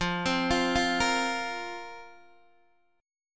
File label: F Suspended 4th F, Guitar Open D